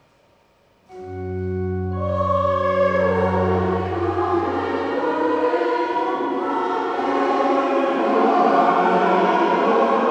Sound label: Allelujah